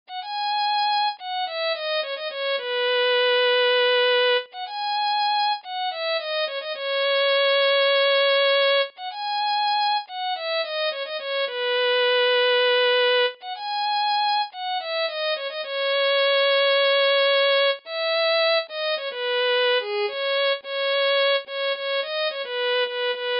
Violin

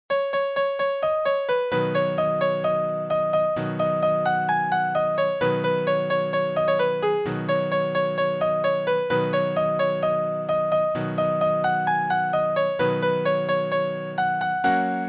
Piano

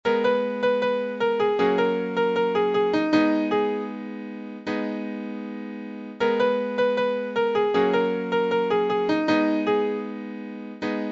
Piano

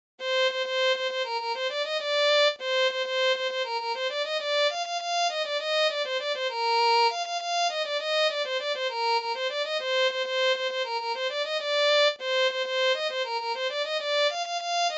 Violin

